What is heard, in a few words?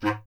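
wind bass clarinet; Miroslav Vitous - Solo Instruments VOL-1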